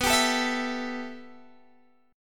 B 7th Suspended 4th Sharp 5th B down Guitar Open G